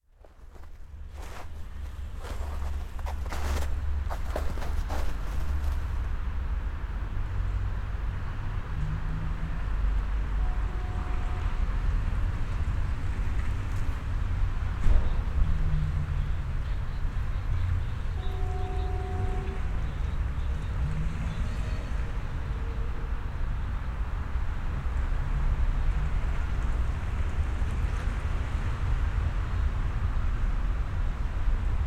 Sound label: intersection; kulikova shkolnaya st; sergiyevposad